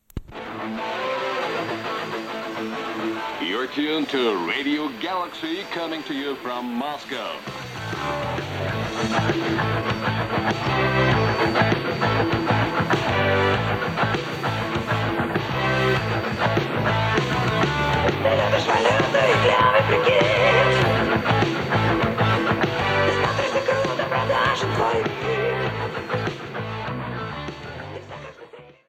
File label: tuned to radio; radio galaxy